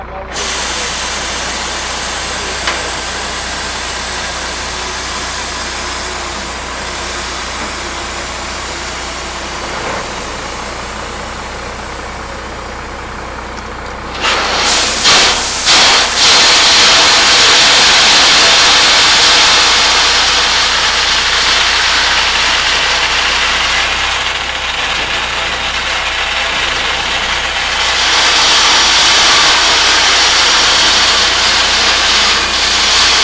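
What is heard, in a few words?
Dump Truck Dump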